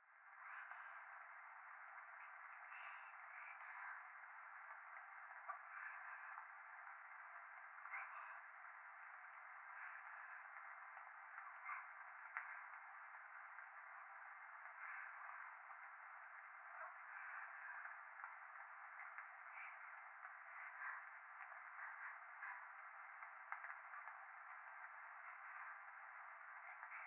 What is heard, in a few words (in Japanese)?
風呂 左耳密着処女喘ぎ 雨